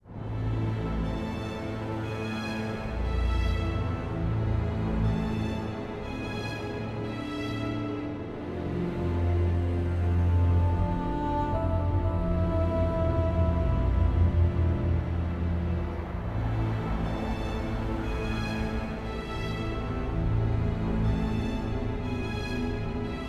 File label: Horror Mild; Sherlock Holmes The Awakened